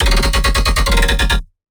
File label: Melodic Bass D# 140, One-Shots Bass Hits; Ghosthack; Color Bass Essentials